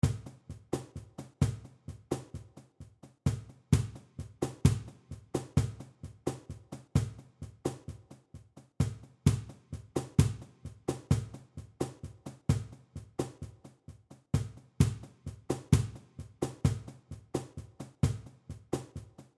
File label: buleria 130, flamenco